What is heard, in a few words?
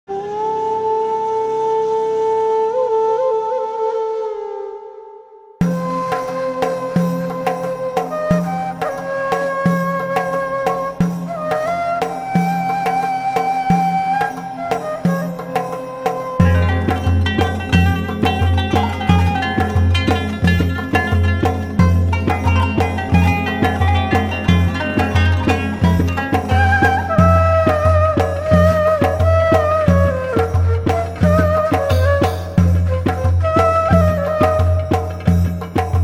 Wind Flute, music on hold